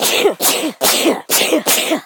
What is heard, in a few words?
Hay Fever Sneeze Sound